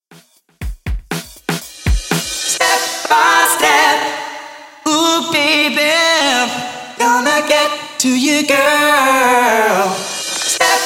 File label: 90s 120 Bpm; Redrum